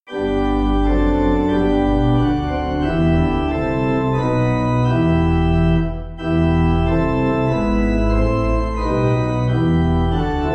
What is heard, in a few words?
Pipe, church music; Lord Jesus Your Blood; Rhau